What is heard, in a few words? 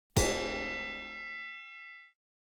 ui room traininggrounds gong